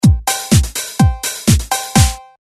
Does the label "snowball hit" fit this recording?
No